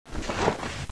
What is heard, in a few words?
go to heal